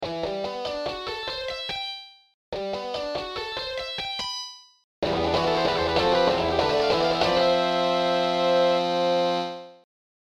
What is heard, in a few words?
Exploring Extended arpeggios